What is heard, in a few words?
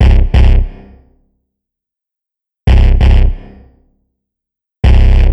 Grunge